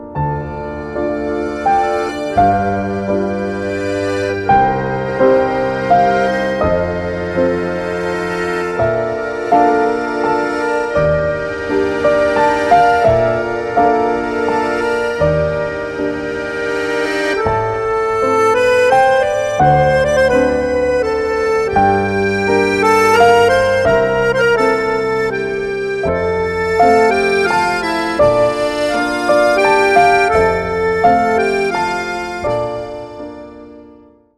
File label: Slow waltz